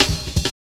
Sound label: Drum Loops, 165.5; Zero-G Jungle Warfare 1, 2 & 3